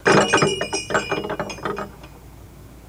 Bell Rattle On Wooden Front Door

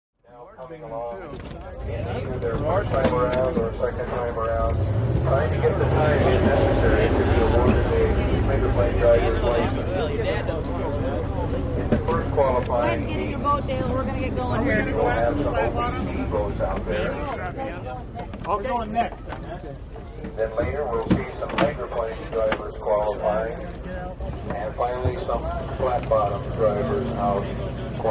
Boat Race Pit Doc Amb, motorboats

